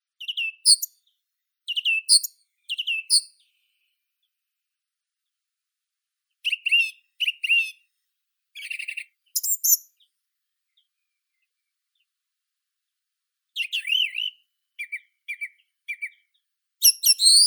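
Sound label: song thrush, turdus philomelos; drozd pevchii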